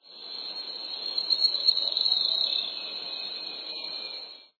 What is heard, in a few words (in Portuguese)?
grimpeiro